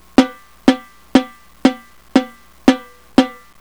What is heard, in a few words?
plus sixteenth turn plus bridge, banjo